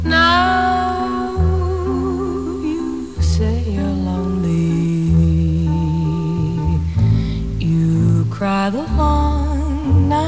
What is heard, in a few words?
Cry